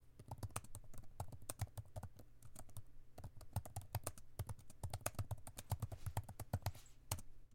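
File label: typing at moderate speed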